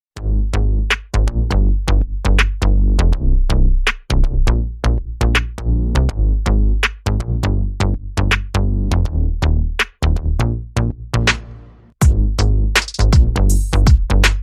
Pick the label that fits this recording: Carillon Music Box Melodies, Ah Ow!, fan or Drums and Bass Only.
Drums and Bass Only